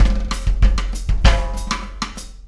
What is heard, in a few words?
Dip Beat